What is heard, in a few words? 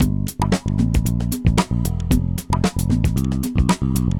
perc Bass